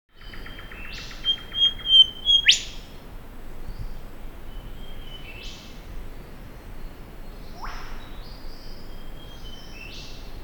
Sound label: Pachycephala Pectoralis; Golden Whistler